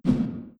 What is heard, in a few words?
swing; slender; trypophobia